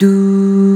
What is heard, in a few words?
OOOHS, VOX; SC55; East West - Global Sounds